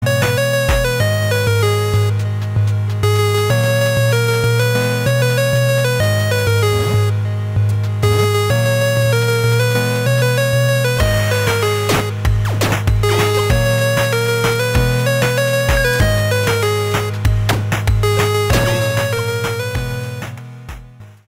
8 bit

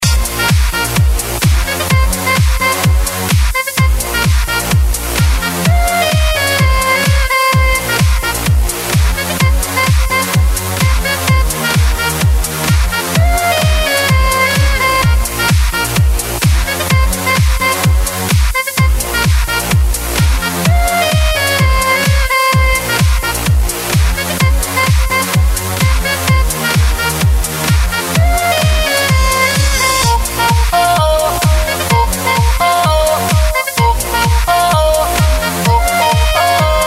saxo version

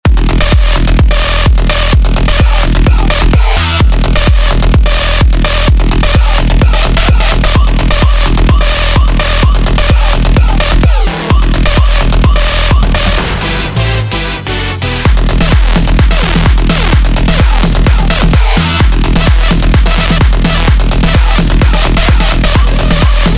edm

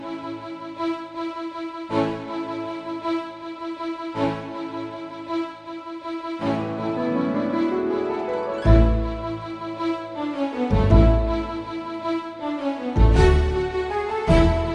symphony orchestra

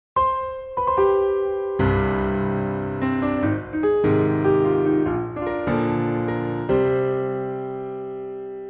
fortepiano; super mario odyssey

